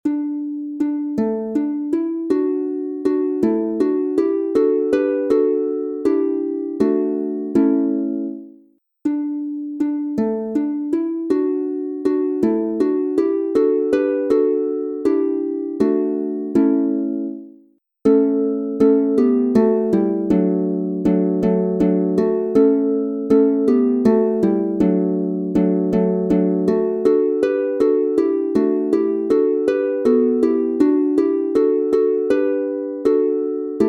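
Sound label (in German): D Dur 3 stimmig, Harfe; Dzisiaj w Betlejem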